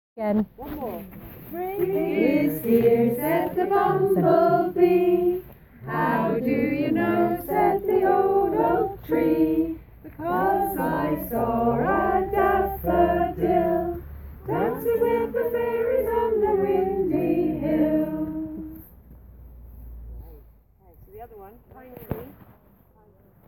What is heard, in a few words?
spring song bumble bee